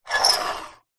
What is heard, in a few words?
foley metal sharp scrape